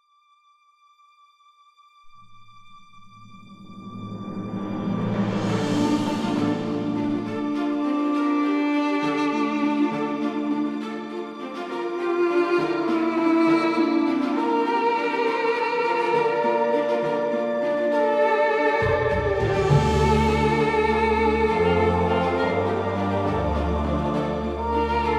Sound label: Film Scoring action ethnic